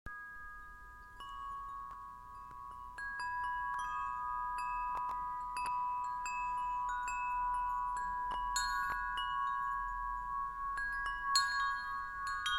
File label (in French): son carillon